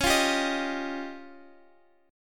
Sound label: F 7th Suspended 2nd Sharp 5th C sharp